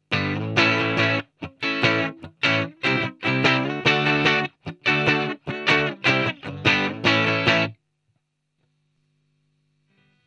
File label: overdrive